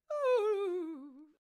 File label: Candlehead Death Vocals